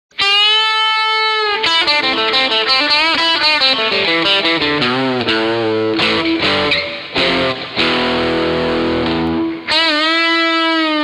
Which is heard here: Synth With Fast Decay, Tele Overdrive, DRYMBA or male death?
Tele Overdrive